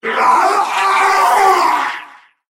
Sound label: death; zealot